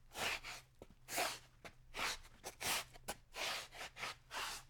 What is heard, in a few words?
Shoes On Mat Rubbing